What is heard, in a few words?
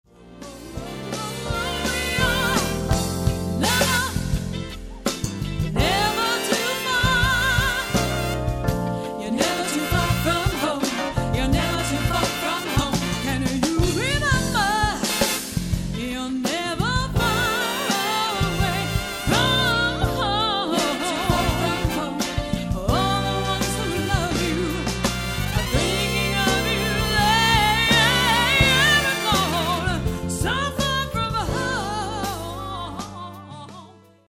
Pop Ballad